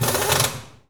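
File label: Impact small, air tools, industrial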